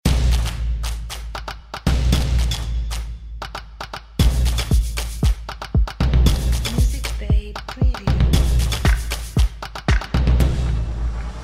Stomp Percussion Tribal Electronic

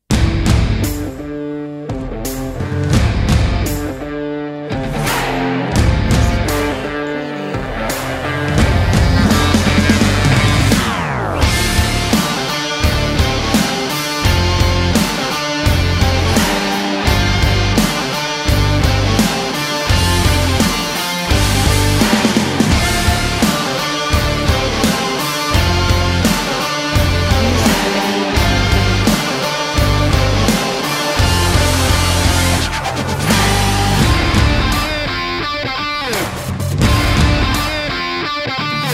Action Energetic Powerful Rock Sport Trailer

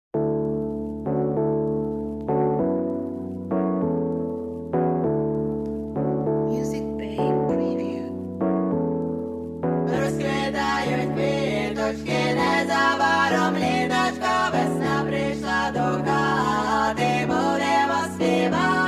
Ukrainian Vesnianka Trap

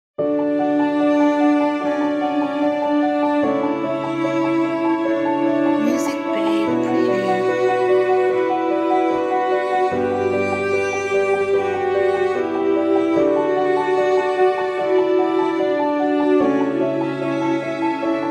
Light Romantic Piano and Cello

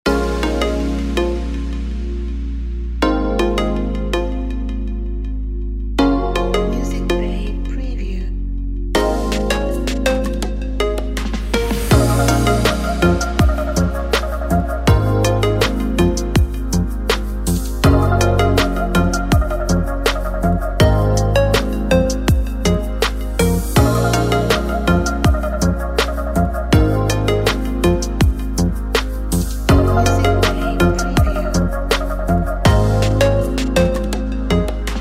Minimalistic Lounge Relaxing RnB

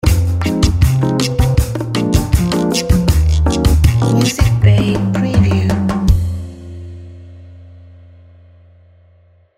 Bossa Bumper

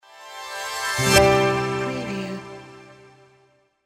Metallic Sting

